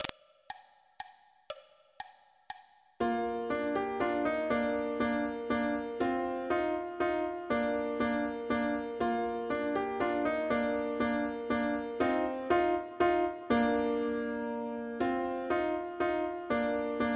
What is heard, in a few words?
german waltz